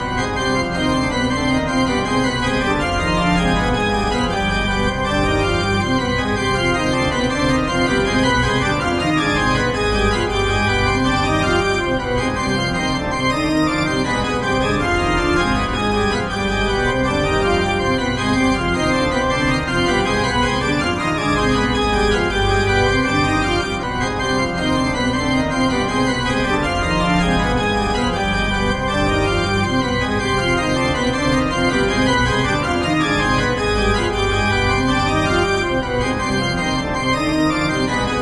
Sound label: Church Organ; Fanatic Reprise; Postal